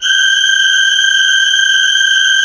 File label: SYM CHOR G 2, Korg; Back In Time Records; Korg Universe VOL-1; Korg SYMPHONY2